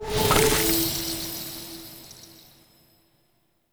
Wevet Seed Pickup

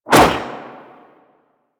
Staff Whoosh; Golden Band; Warframe; Monkey King

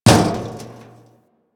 Physics Barrel Impact Medium, Metal Barrel

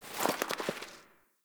organic pickup; Objects; Pickups

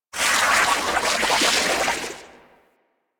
Puffer Plants; ambience; props; one offs; Warframe; Venus Open World; Venus Landscape

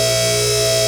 Gas City Hydraulic Lift Loop; Ambience; Props; Warframe; Corpus Gas City; Props Remaster

